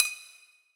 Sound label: Kitchen Foley FX Drums